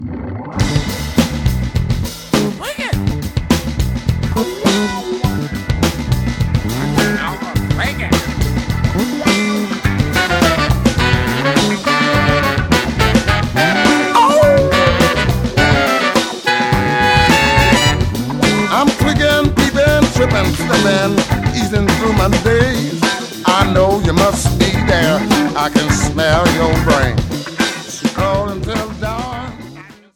funky

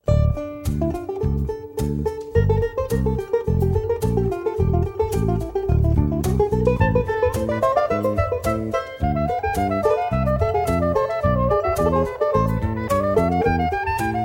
jingle

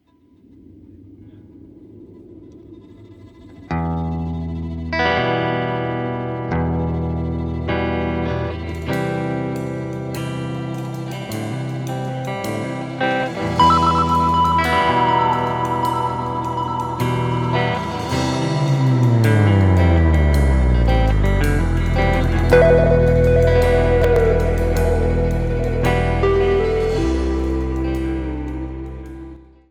creep funk

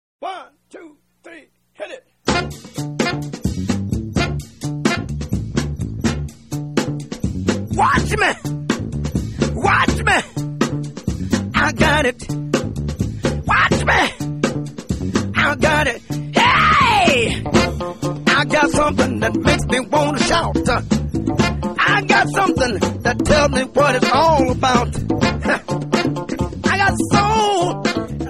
70s funk

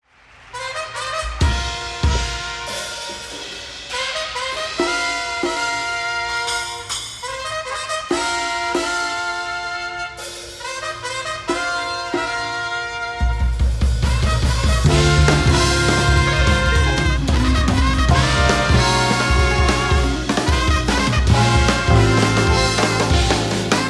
trumpet

